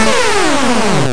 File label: die